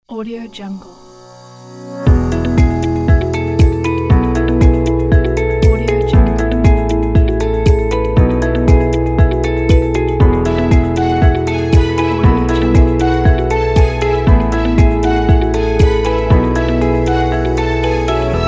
upbeat ident

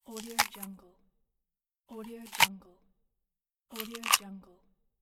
bite apple

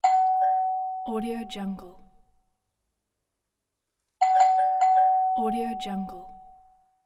doorbell ring

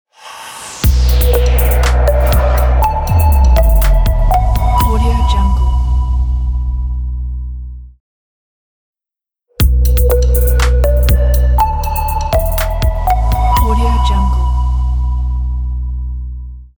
clear logo

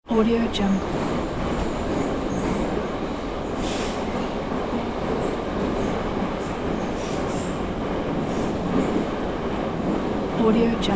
subway train; soundfxpro

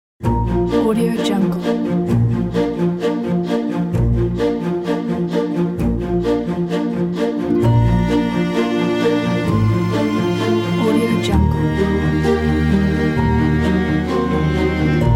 classical

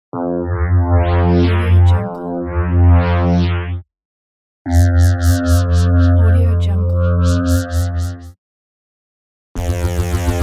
wobble bass

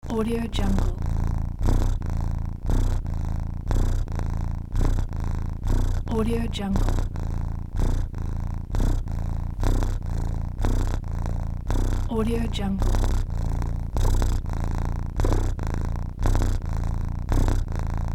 cat purring

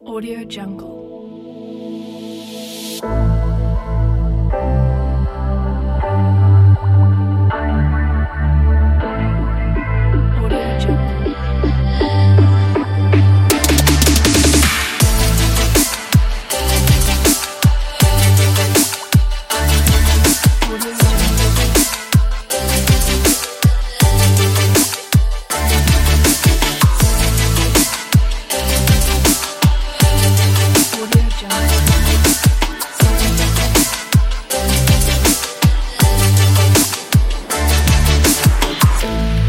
the future bass

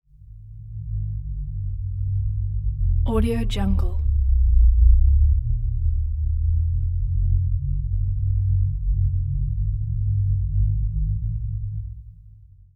rumble moan

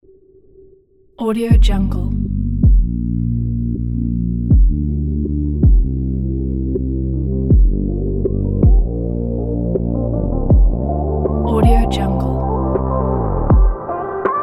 trap modern motivation

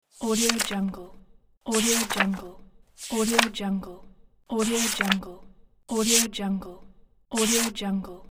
paper slide

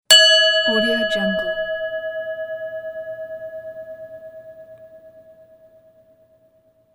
small tibetan singing bowl

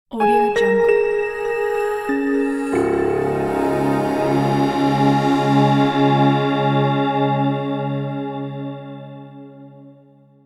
romantic space logo

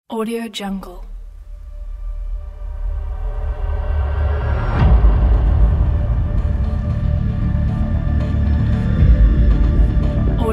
hybrid intro trailer; stlabstudio

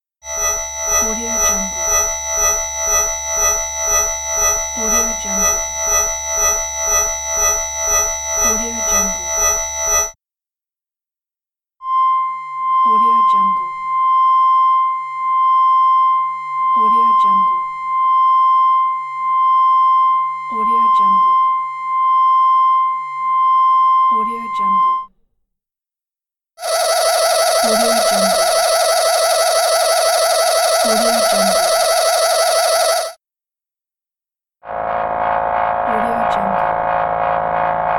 wave oscillating fx digital; audioefx

